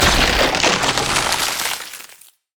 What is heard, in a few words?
ring of frost; LoL; lissandra